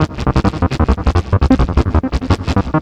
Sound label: Acid Bass; Sonic Foundry (Sony Creative Software); Knutrix Drum & Bass